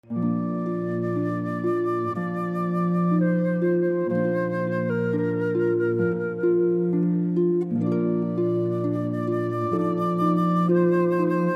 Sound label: Panis Angelicus, Franck, harp flute cello